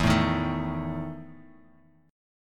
E Major 7th Suspended 4th E; strummed; JGuitar

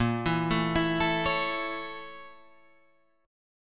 Bb Minor Major 7th Flat 5th, Guitar, Drop D; 8,7,7,9,10,9

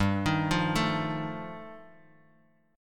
G 7th Suspended 2nd Sharp 5th, G, chord; down

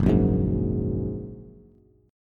Gb Major Add 9th, down, Bass; strummed; JGuitar

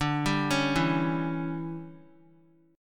D Major 7th Suspended 2nd Suspended 4th D, Guitar; DM7sus2sus4 arpeggiated

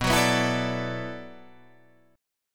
D 6th Add 9th B

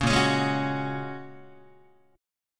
A sharp Minor 7th Sharp 5th, Guitar; strummed; 4A,4E,5A,5D,5G#,6C#; 4,6,4,6,5,5; JGuitar

